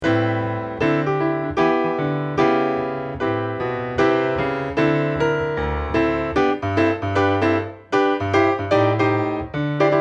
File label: accomp; good news chariots a-comin